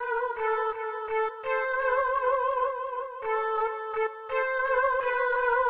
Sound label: Latin HipHop Synth A min; Digidesign; Pro Tools Essential Loops